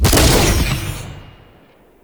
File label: Gun Blade Shot, Weapons; Warframe; Corpus